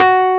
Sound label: EPF F#3; AKAI S-Series CD-ROM Sound Library VOL-2